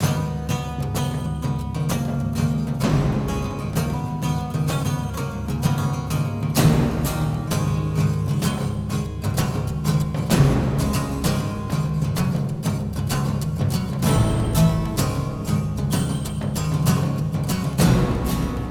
Combat

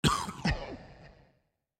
Cough; Going Under

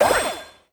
Assist Off

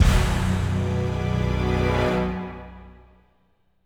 Fanfares, mus fatality end; MK9

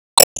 Enemy Bossegg Move

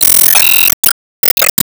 Score Btl Finish

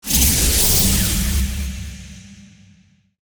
Warp; SE Manzai#15 (SE123 Man Warp)

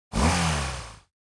Board Upgrade; subway surfers city sound effects

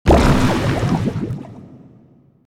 Blood Laser

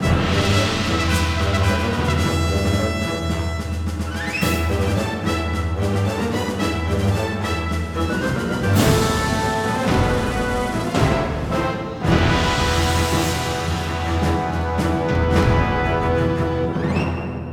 Boss combat; Bosscombat Ch04 03

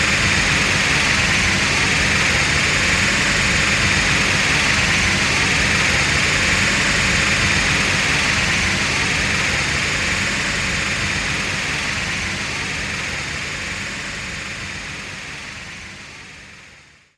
Enemy Factory Active Loop

